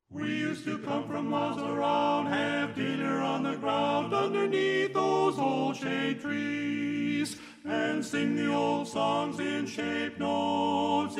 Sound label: Tenor